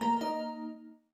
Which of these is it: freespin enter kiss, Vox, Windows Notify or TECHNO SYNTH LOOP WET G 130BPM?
Windows Notify